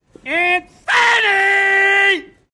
Yelling

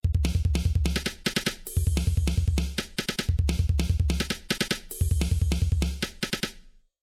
Simple Drums Ring

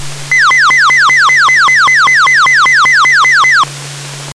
Dispatch Warble

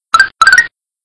egg hatch; yoshi

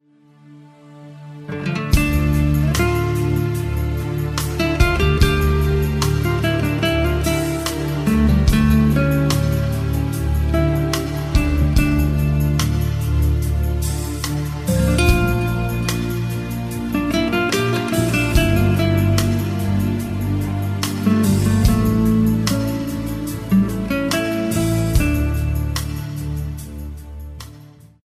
old guitar; country

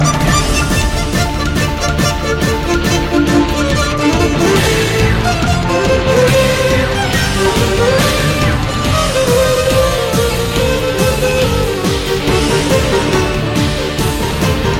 Trill

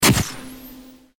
camera click; ringtones; sms

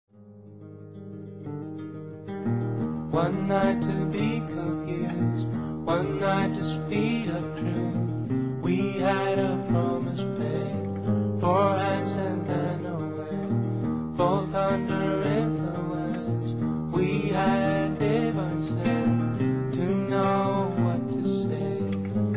heartbeats

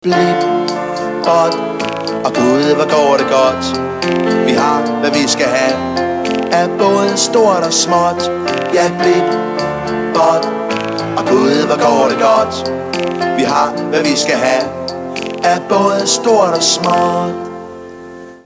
Blips

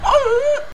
auuaauhhh; Nerdcubed

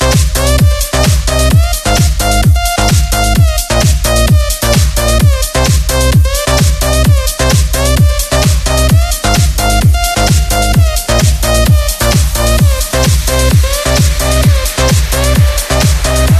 Club Ringtone

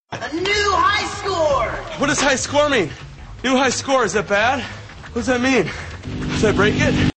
High Score

